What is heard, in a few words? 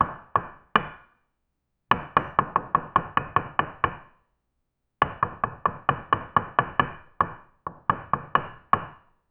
a short clean heavy stone, chess